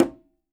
Quinto, Percussion

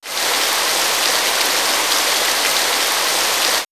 Nature Wet Wind; X-Static Goldmine

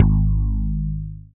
BAS TX-802; Best Service Gigapack 2

